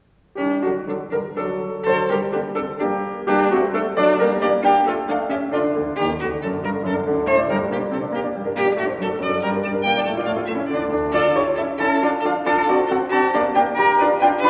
Sonata for violin & piano No. 10 in G major, Scherzo: Allegro; Beethoven; ＂The Cockcrow＂